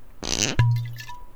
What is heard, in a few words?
cork; Melody Assistant